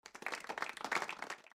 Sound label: Individual Applause